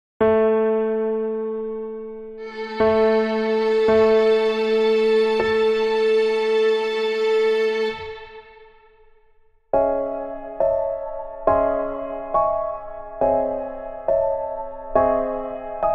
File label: Tuning